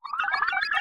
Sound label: Beep-Falling Rev